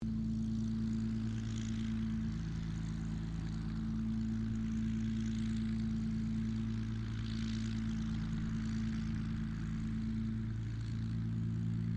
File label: AIRCRAFT SUPERMARINE SPITFIRE MK-XVI IDLE DISTANT; Airplanes; Vehicles; Airplane Sound Effects